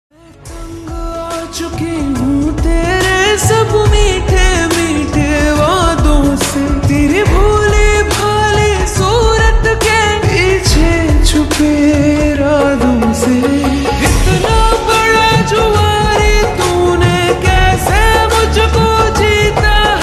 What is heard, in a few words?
Slowed Reverb Ringtone